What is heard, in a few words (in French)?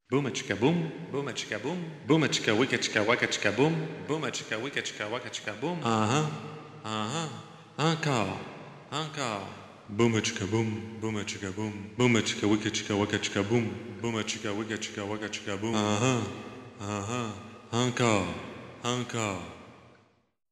boum atchi ka boum